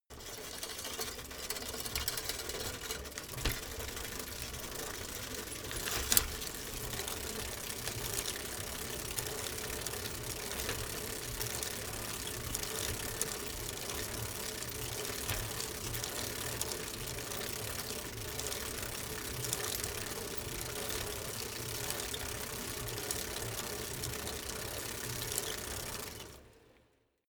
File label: bicycle rickety pedal shift up